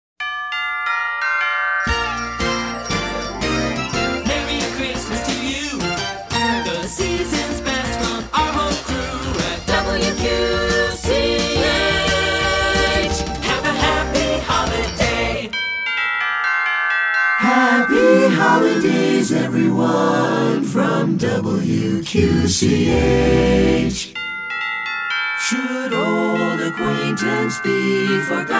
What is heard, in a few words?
Jingle; Short Attention Jingle Show IV